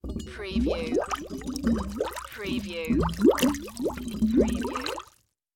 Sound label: Tank Bubbles